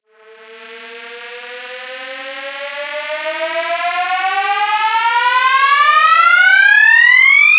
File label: 126, fx loop uplifter riser tonal